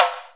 Snare, Akai MPC-500; Toolkits; Snare TKit

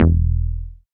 BAS FM DX-7

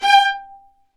STR, Vas Marcato; STR Vas1 Marcato

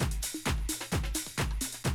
130, Classic DrumLoops

